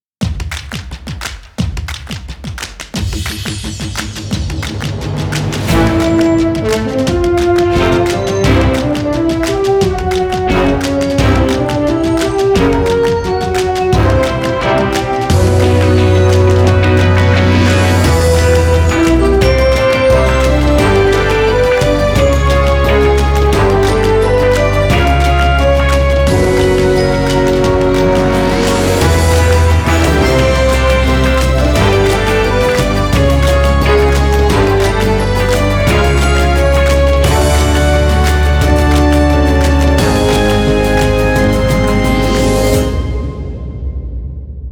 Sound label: Percussive Upbeat tv theme with guitars